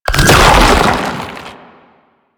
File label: Octopede Horrors Charge; Warframe; Man In The Wall